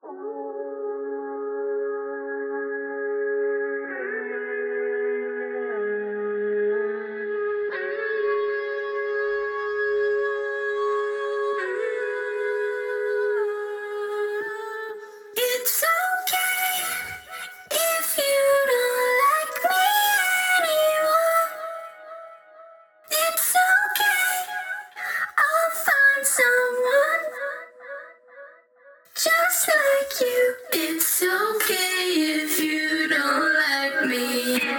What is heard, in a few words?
full vocal bus